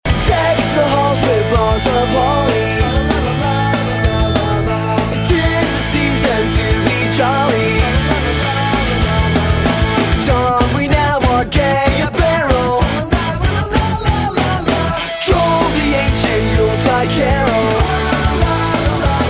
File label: Deck The Halls Rock